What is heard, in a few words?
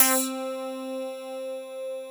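clavinet C3; CLAVNUTZ; Synth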